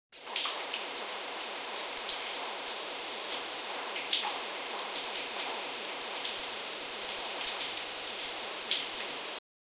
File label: soft shower water flow, bathroom